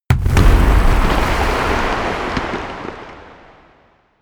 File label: eruption, erdbeben